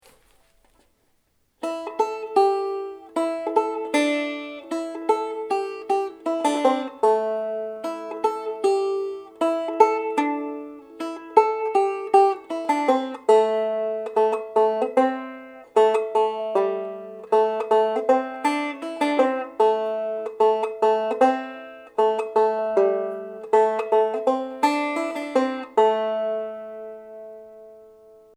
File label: cluck melody